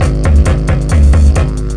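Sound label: rhythm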